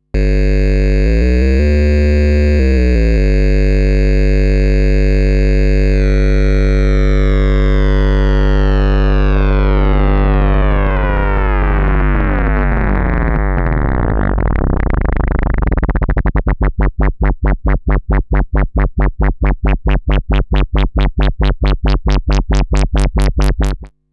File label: Audio Rate FM Filter Model 15